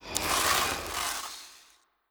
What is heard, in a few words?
zombie scratch, brickwall